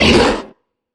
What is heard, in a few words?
troll monster hurt pain